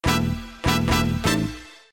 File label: latin brass; lmms